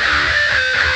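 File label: Guitar (metal); Sonic Foundry (Sony Creative Software); Ugly Remnants VOL-2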